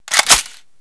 ak47 bolt pull

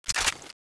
bolt release, weapons m4a1

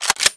FAL Cock

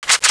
clip in, weapons

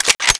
bolt slap, weapons; ump45

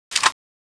de clip out

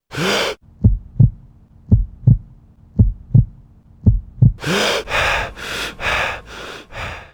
zoom

